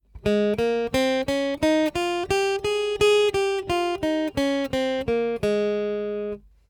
Ab Major Scale, guitar

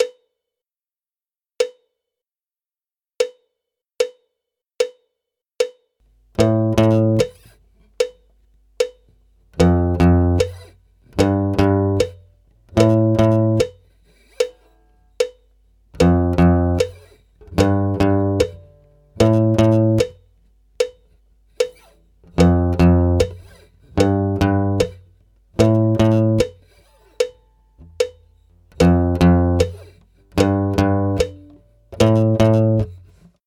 Riff Acoustic, guitar

